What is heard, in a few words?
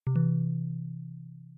balloon Pickup; balloons-and-static-electricity